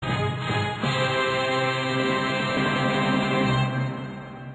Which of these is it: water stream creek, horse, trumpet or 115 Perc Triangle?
trumpet